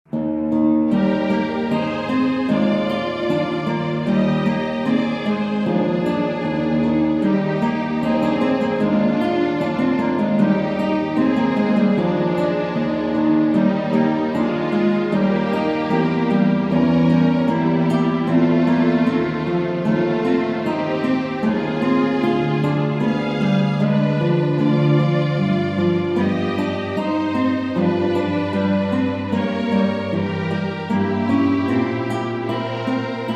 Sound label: Instrumental; Bach; St John Passion